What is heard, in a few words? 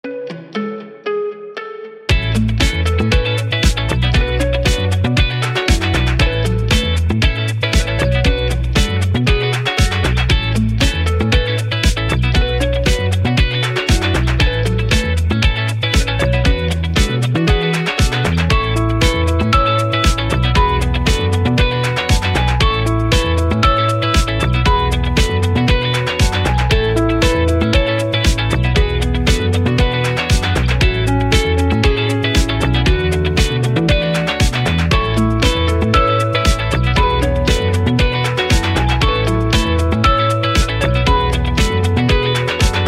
Action Pop